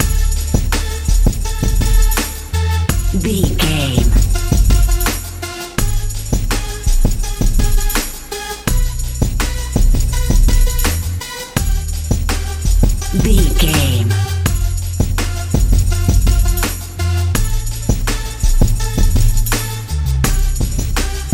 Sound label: rap feel